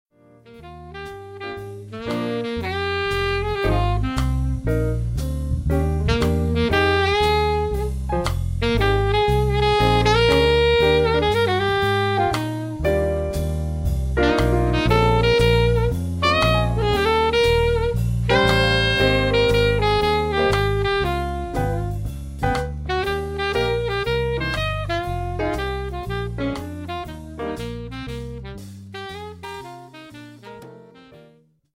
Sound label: standard, Jazz Trio